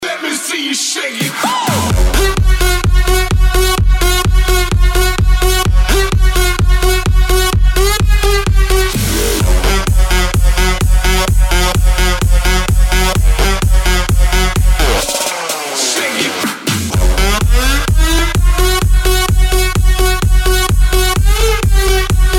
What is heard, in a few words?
dutch house